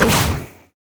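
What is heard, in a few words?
blue minion wizard basic attack; LoL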